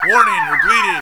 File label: bleed